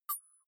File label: click rough high, signale